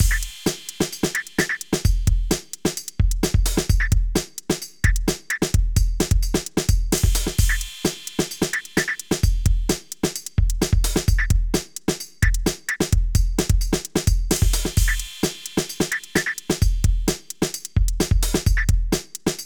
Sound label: Beat Scholar, Drum Machines; modalics